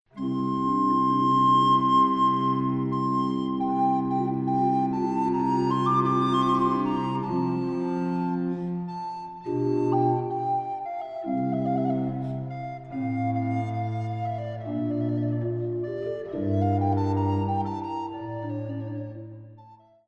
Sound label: Sonata a Fluto Solo e Basso continuo II Adagio; Sybrandt van Noordt